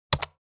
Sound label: Mechanical keyboard keystroke pressing spacebar